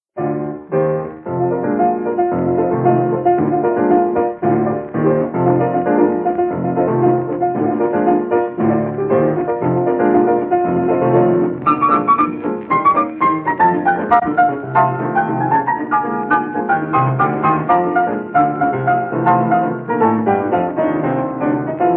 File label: Zulu Piano Medley